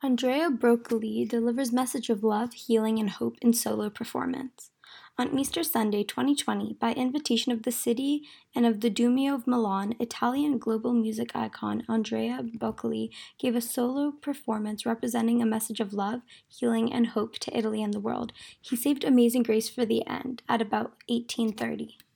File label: Sings Solo